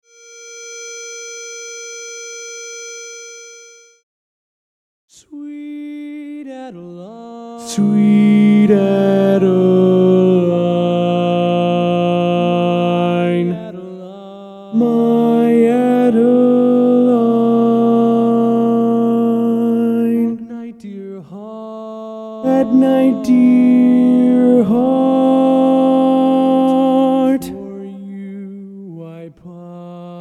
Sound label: Baritone